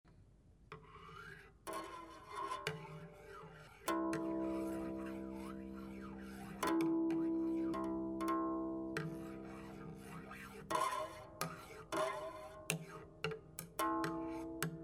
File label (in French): baguet pilier, touchers de la harpe chinoise; piliermix